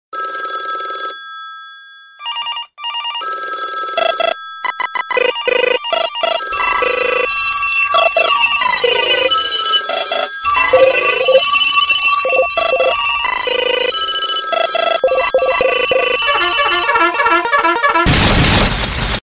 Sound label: Irritating New Tone